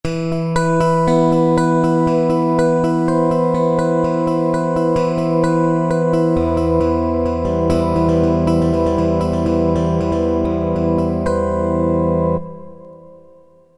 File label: Elec Gtr, Roland MT-32